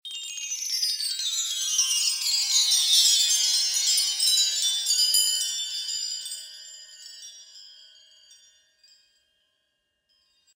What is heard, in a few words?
Chimes